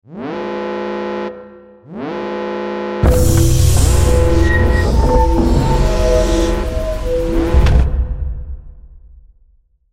Sci Fi Cargo Door Space Station Air Lock Seal; Spacecraft; Blastwave

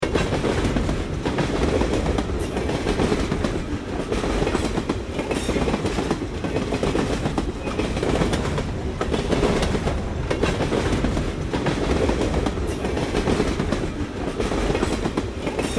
Vehicle Train Pass By Steady Loop; Vehicles

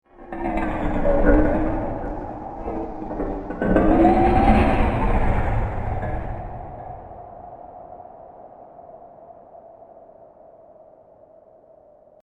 Scary Scene Wind And Metal, horror sound effect; Scary Scene Fx - Wind And Metal; Scary Soundscape Sound Effects

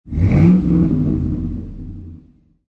Truck 5.3 Liter Rev Exhaust Perspective; Auto, truck, motor. 5.3 Liter Truck / Lorry. Rev engine one time, exhaust perspective.

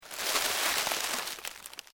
LEAVES DEAD MOVE, Rustling; Material components for constructing more complex sounds; Rustling (Sound Effects:Objects)

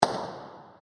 Massive Firecracker Bang; Fireworks; Backgrounds; Crafted Signals

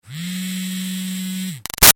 Phone Cell LG Vibrate Buzzy; Cell Phones; Electronics

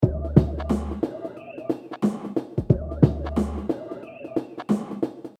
Hip Hop Drum Loop 90 Bpm Swing Lo Fi; Without Screech; Everybody Now Swing Without Screech; Royalty Free Drum Loops

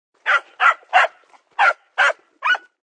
Yppy Dog 6x, Yappy dog barks; Yappy Dog Barks 6 Times; Dogs; Sound Effects:Animals

